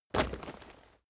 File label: leather metal impact chink